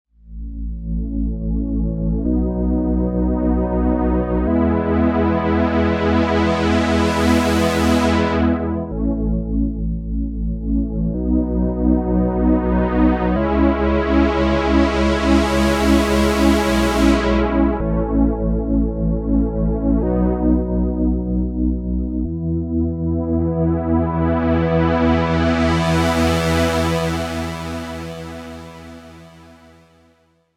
Strings

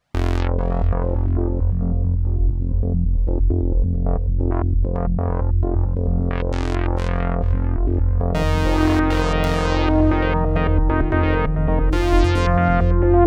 Pulse Machina

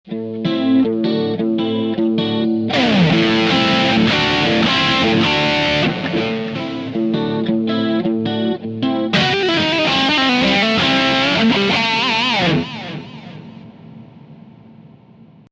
dyna lead clean

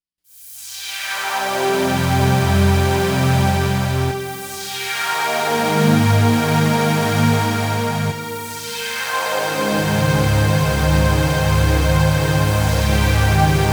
sweep pad

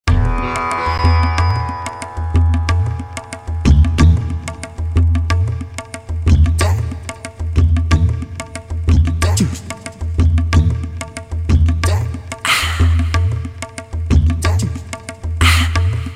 sitar and tabla

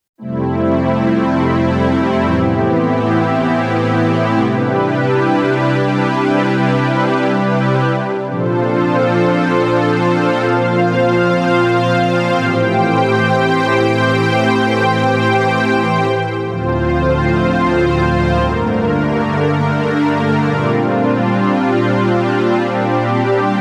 vr-09 jp8 strings

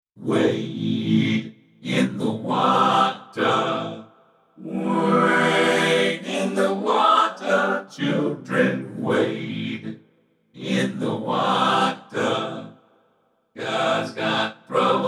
gospel

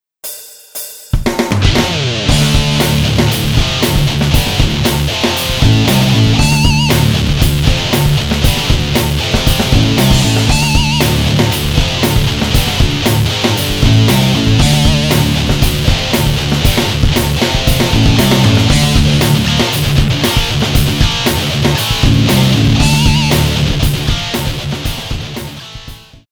patch demo

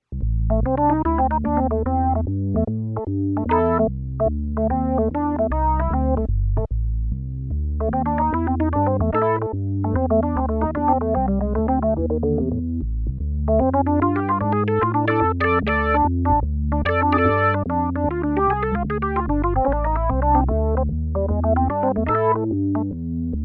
Organ I

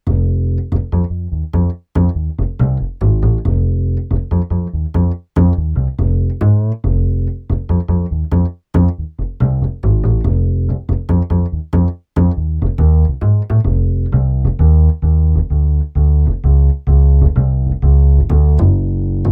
upright bass

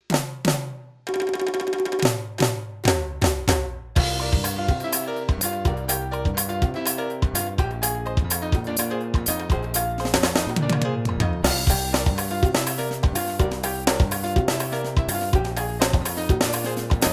td 6s snag latin

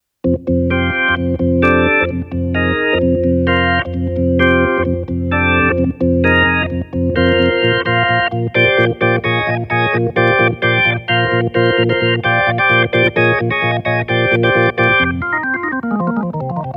rock organ

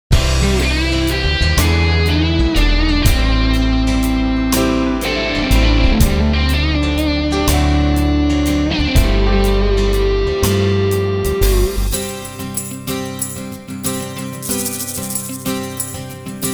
live band

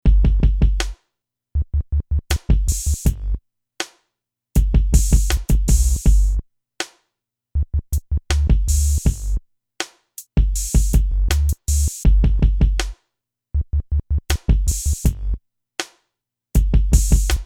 beat

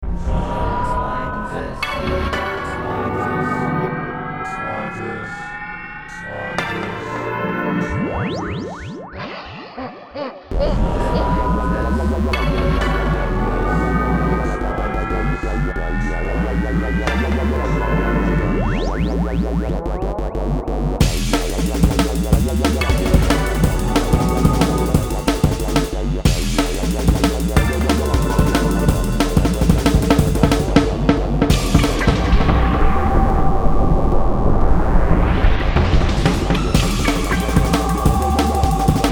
drum n bass; fantom x

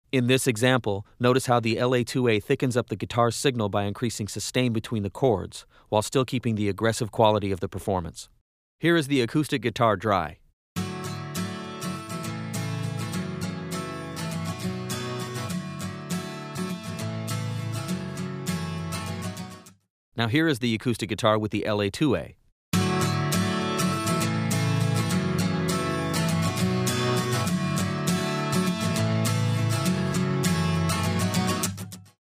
LA-2A guitar

